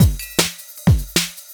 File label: Beat, Futurist Drum & Bass; Drums & Percussion